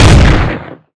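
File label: shotgun fire, weapons; q3rally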